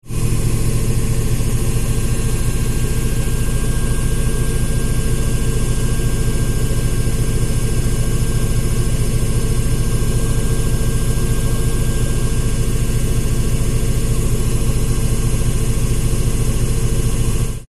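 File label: Washer Spin Cycle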